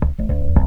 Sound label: BAS RIF; E-Lab; X Static Goldmine 2; akai